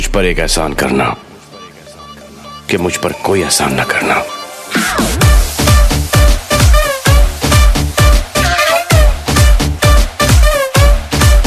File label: Remix Ringtone for Mobile; Salman Khan